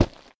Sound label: Ocean Ground Thud; blastmorph pinball; xbox series xs